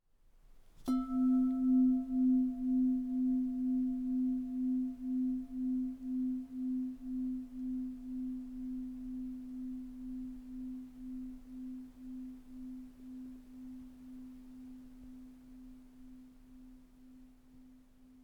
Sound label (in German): Roehre